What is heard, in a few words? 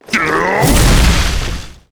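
attack, monster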